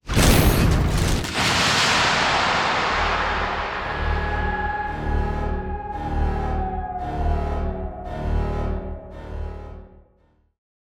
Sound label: mirv launch; OpenFrontIO